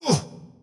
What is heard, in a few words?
hurt; billy shadow